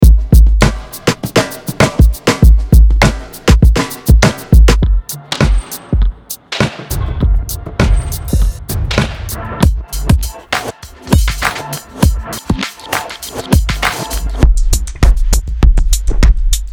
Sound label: Downtempo drums; Black Octopus Sound; Downtempo Delights